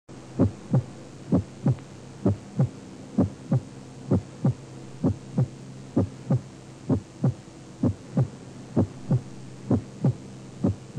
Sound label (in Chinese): 第二心音增强